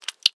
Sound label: Sex Slide